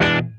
E7 CHOP, Funk Guitar; Zero G - Funk Guitar

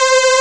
led synth; Amiga Soundtracker Sample Packs

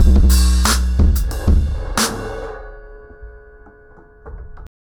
90BPM, ACOUSTIC DRUMS

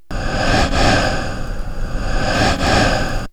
FX; AMG Megabass REMIX! VOL-2

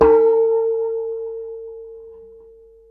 Tin Bucket, contact mic, Metalics

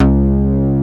FUNKY, MIDI BASS; East West; Global Sounds

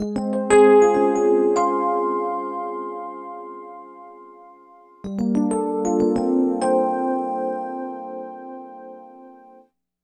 EP, Keyboards, Electric Pianos

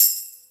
Tamb

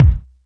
BD, Dance Mega Drums, BD HOU; Best Service

